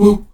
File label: AHOO G#3D, Heart of Africa, STAC.OO-V; Spectrasonics